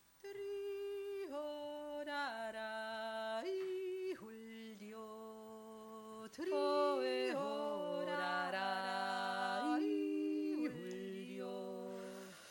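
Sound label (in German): dritte stimme, jodler; aberseer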